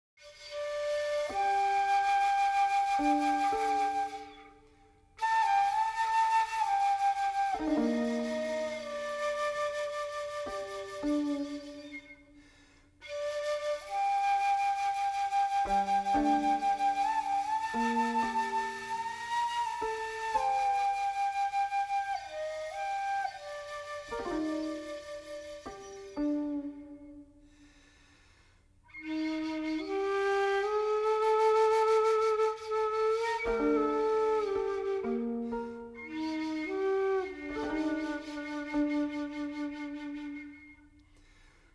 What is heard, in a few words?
Japanese Flute Koto